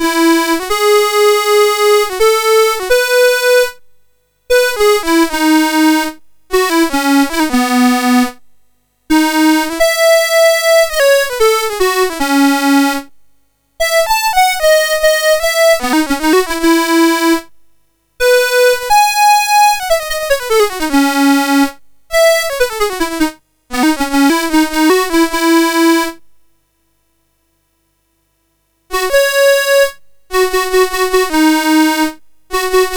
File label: Una voca poco fa, MOS6581R3